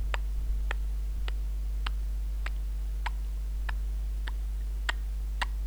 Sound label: steps